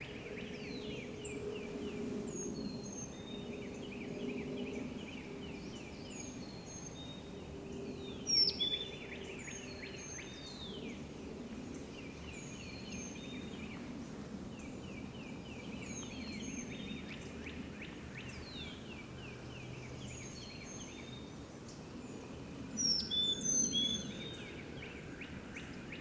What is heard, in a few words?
Carolina Wren, Falls Lake; Rollingview